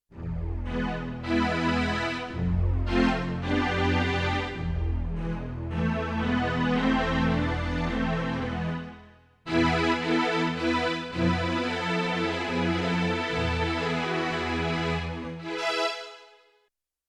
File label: String Set Detune, Yamaha TX16W; Orchestral Performance 1